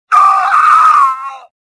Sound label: death; Star Wars Jedi Knight II Jedi Outcast; XBOX; shadow